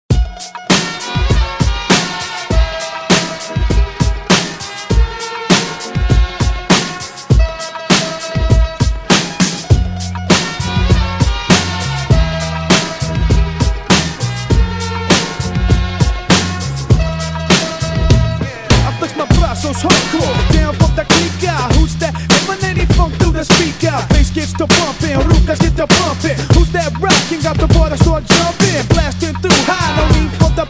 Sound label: Old School Hip Hop